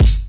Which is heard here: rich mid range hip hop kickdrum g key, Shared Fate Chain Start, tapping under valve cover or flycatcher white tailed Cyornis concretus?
rich mid range hip hop kickdrum g key